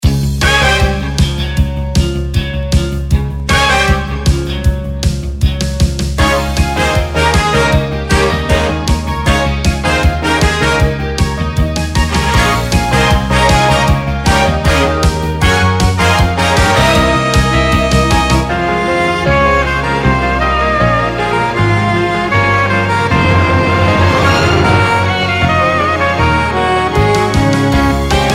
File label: Jingle Radio Mix